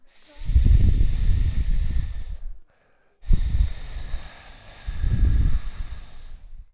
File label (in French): Serpent